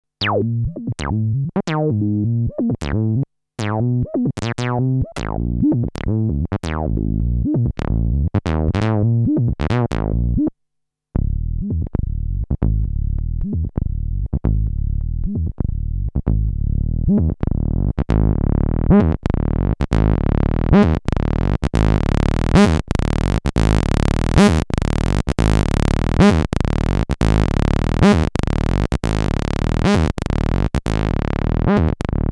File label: TB303